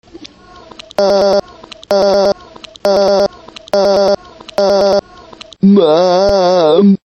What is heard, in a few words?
beat